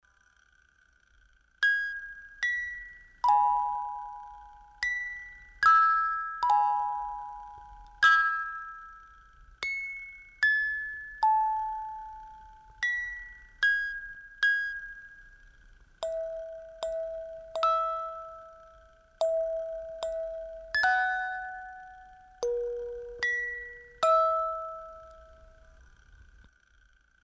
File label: music box